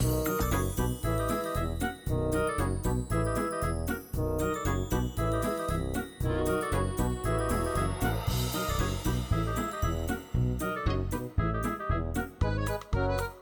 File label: Music Fun; thomas friends magical tracks; android